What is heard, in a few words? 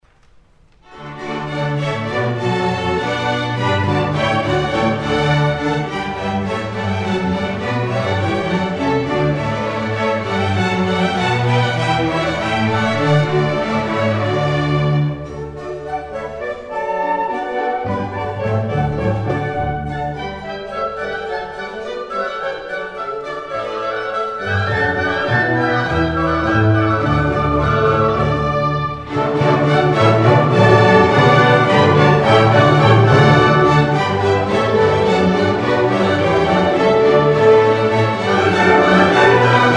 Water Music III Air, Concert Orchestra